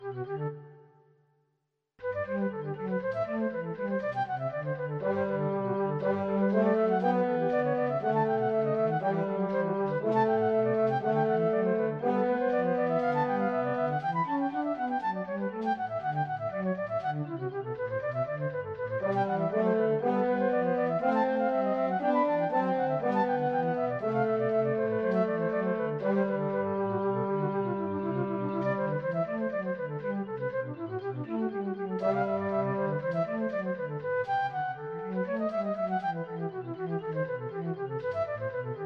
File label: bwv 734, recorder